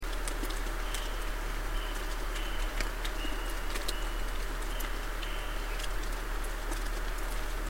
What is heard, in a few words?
mystery chirps